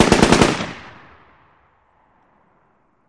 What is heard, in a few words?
Machine Gun Sngl Burst; bursts